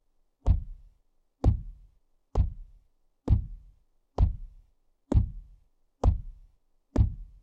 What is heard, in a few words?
monster steps slow; blindside